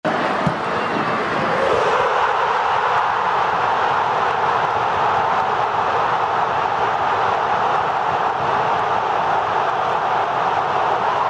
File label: Cheering